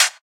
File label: dry closed hi hat sound f sharp, Royality free hat sample tuned to the F# note; hat samples